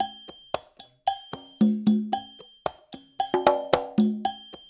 LOOP, Percussion; InVision Interactive; Percussion Slam; ITCHY

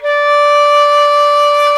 FLT XFLT, Flutes; Club-50 - Foundations Roland

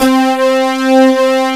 POLYCLAV, SWING SYNTHS; Zero-G - Funky Elements

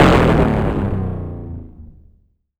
DRM PRC Ripped Tom; Polyend; Plughugger

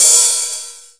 HH OP, Drum Dry; East Collexion; Drum 1 Dry

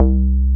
Synth Bass, Roland

